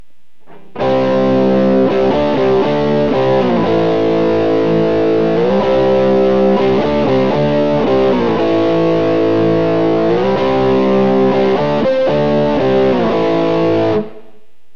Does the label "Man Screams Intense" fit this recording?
No